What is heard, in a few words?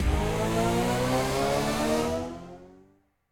respin Snd, slots; king of slots